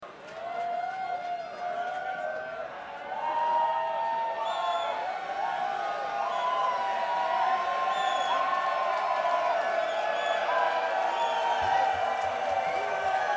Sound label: Crowd